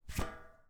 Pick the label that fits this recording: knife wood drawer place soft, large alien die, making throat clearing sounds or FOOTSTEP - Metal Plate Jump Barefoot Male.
FOOTSTEP - Metal Plate Jump Barefoot Male